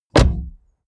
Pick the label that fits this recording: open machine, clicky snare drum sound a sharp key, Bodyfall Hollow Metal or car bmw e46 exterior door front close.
car bmw e46 exterior door front close